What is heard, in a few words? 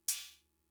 Cymbal, Fricke MFB-522, Drum Machines